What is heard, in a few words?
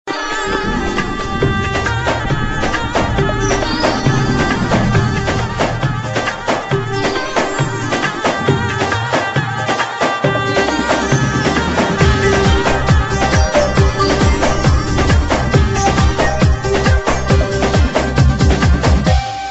Arabic Tone